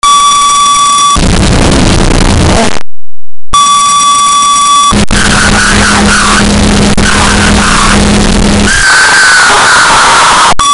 Rotten Noise

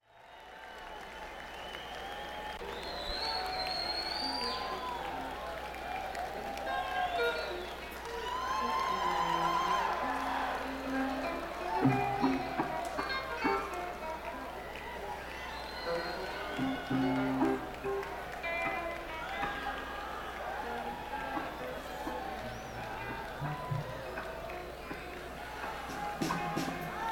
Crowd; neumann km84i

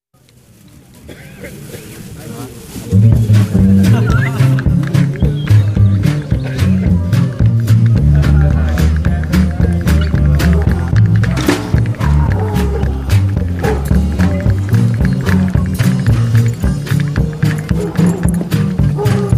Jazz Improv; 2000 Rainbow Montana

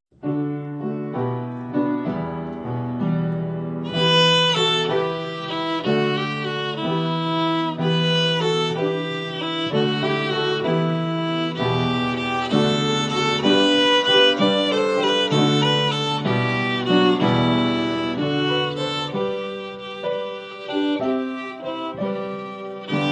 We Three Kings Piano Violin Duet

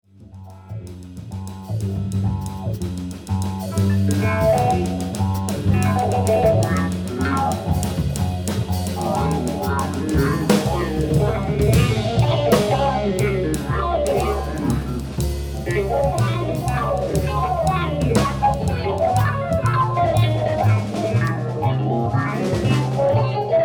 Blues Lounge

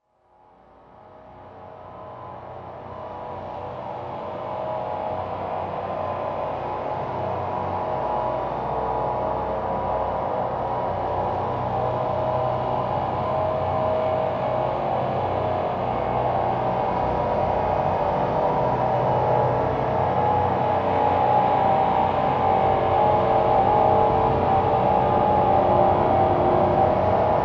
Grainy Drone

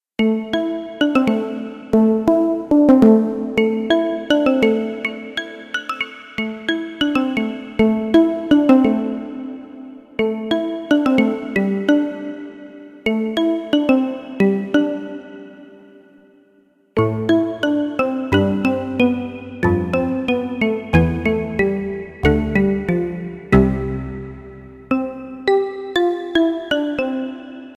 Mallet Key; Kawai K1; Bank 2 card A

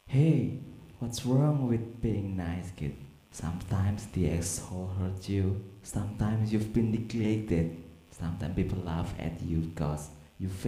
Beat Box Rock

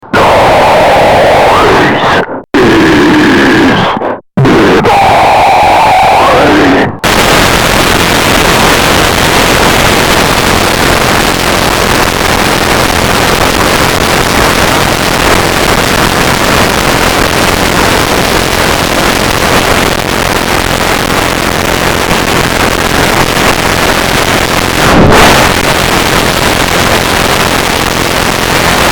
noise is divine analog set

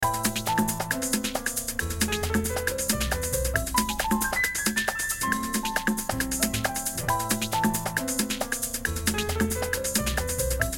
Modern Jazz

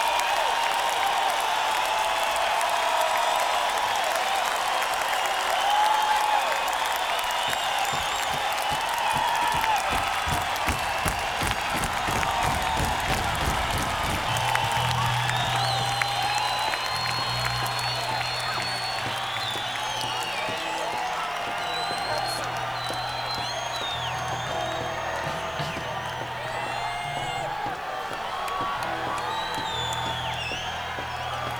Encore Break

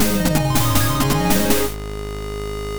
Level Clear; Radium Soundtrack

